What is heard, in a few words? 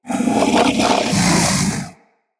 Vocal Breathe, Hunting Critters; Characters; Predasite